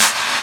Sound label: Claps; Vengeance - Essential Clubsounds Vol.2